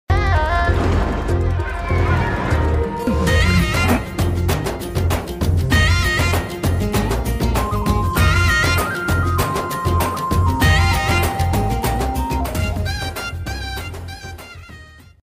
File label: heroine entry bgm; Sridevi Soda Center; Sudheer Babu